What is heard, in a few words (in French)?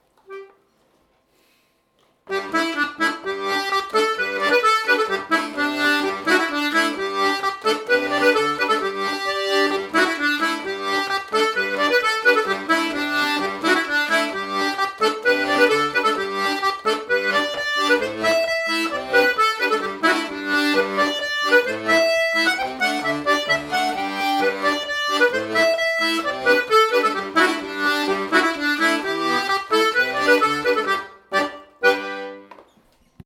Polka ecossaise